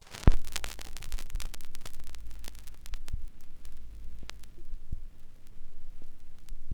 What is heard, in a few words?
vinyl; Masterbits; Vinyl Attack